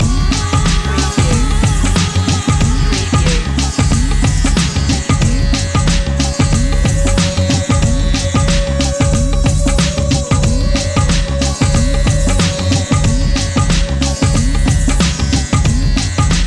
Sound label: groove loop